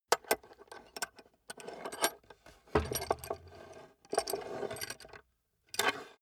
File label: small metal handling on wood; industrie wirtschaft; metall